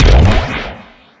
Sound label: jelly bounce